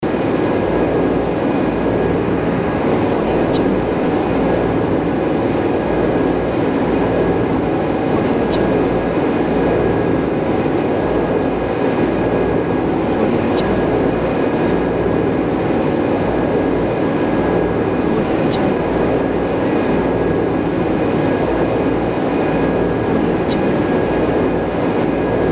factory ambience; n-audio

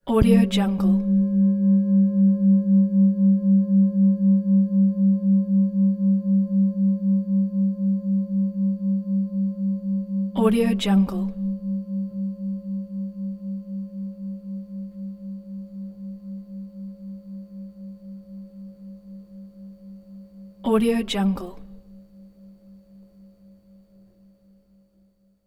quiet singing bowl

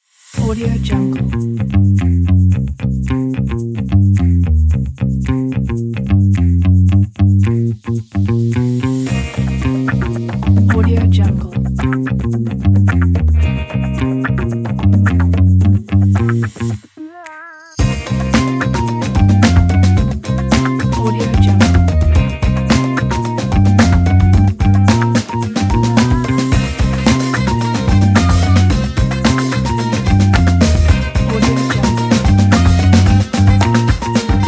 funk

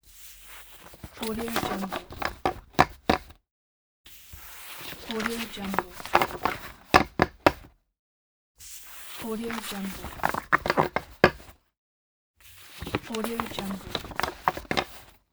sort paper on table

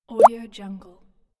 water drip

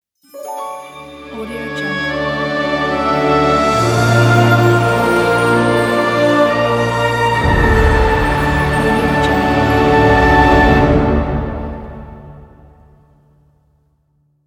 cinematic fantasy logo

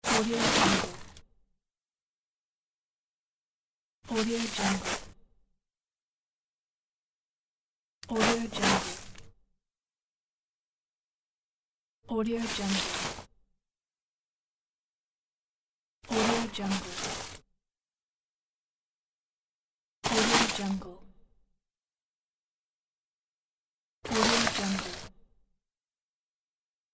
ice debris and crunch

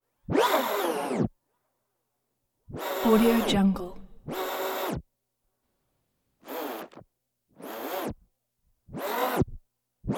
servo short bursts whir various speeds

